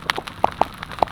pops, soniferous; Gilligans Island

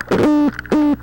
Opsanus beta, soniferous; Counch Key

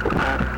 hickory, soniferous fish

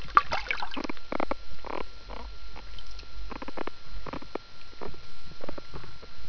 Ictalurus punctatus, soniferous; Tivoli Bay

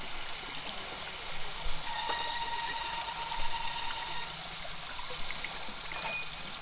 brakes, brake like noise, fish

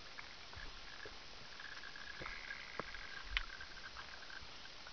reeling, fish; tivoli bay

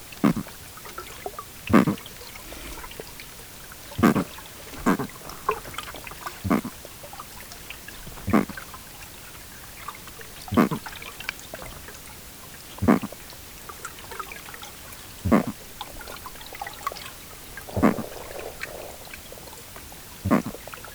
Oxydoras niger, Amazon, soniferous

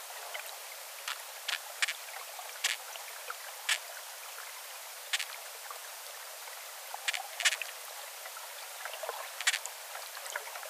Rhinodoras boehlkei; Amazon

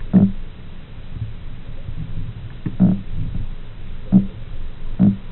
Leiostomus xanthurus; Carolina fishes

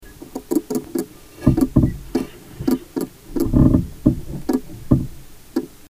Sorubim lima; Amazon

